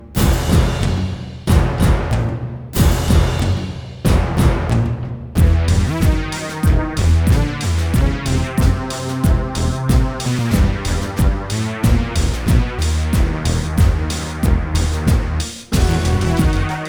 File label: Boss Generic Loop